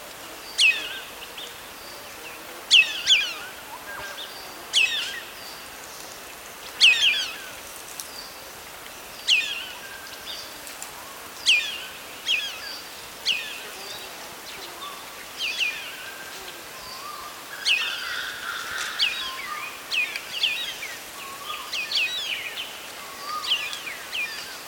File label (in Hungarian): háromszínű kakukkhéja hangja